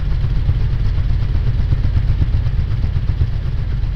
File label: liner, vehicles; Soldier Of Fortune II Double Helix; XBOX; ambience